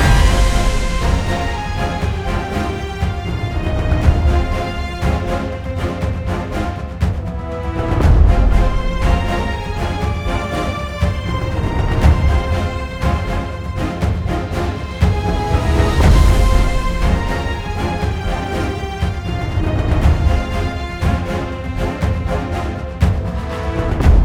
Action Loop

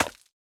Slime Impact Small

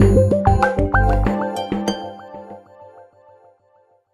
Jingle Finish Silver; super rub a dub; ps3

